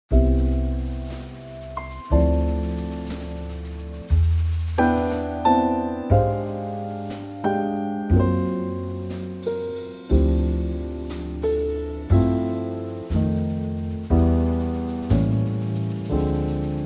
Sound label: trio C